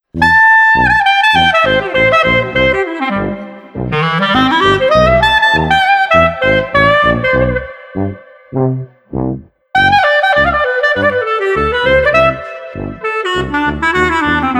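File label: Street Clarinet Combo Upbeat no Drums, 100 bpm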